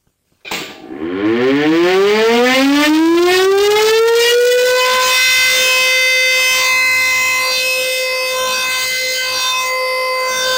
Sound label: sample siren